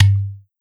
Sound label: Perc Udu Low; Iyankc